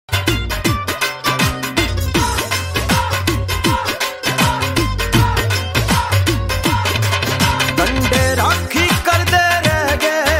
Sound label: Punjabi ringtone, bhakti ringtone